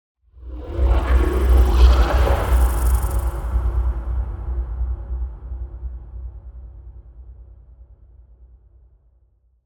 Just Transitions Creepy; soundbits

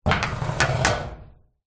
small branch stick scrape on metal garage door; foley

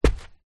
footstep barefoot single on worn carpet

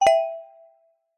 notification bright metal tuned mallet chime hit; zapsplat

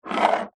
money coin slide push wood table; zapsplat

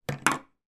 hairbrush down on table

